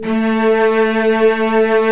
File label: Vcs2 f Slo; STR Vcs 1-2 vb